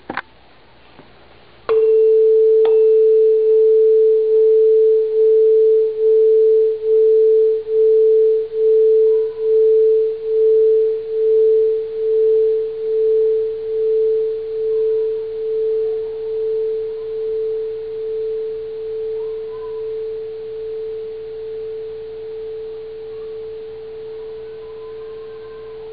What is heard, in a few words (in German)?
Schwebung langsam